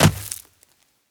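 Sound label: dirt to land; firefight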